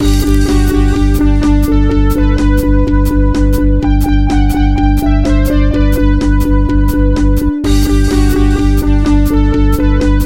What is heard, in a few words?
Menu Music, MIDI; Formula One (Sega Genesis / Mega Drive)